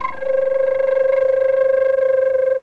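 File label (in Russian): модулированная флейта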